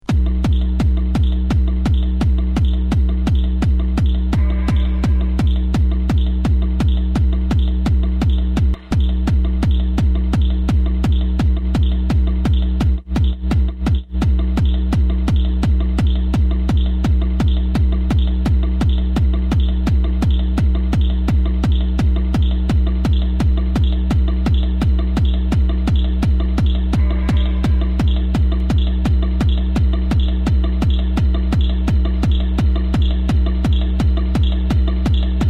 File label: hc-ht mix 170-180 bpm